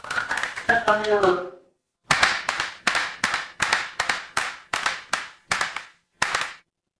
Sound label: crowd applause